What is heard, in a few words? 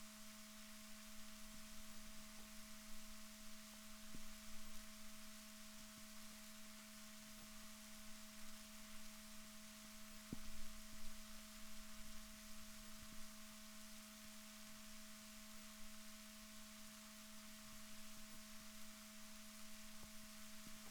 rorquals positif, Balaenoptera Physalus Chile